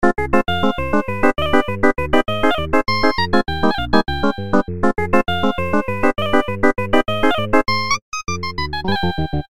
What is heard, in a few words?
samsung s8 papyrus tone